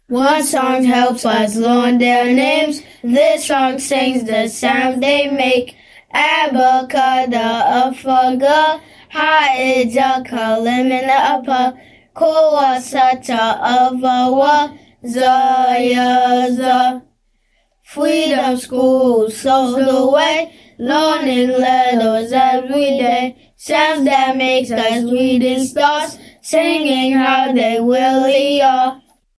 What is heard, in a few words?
Acapella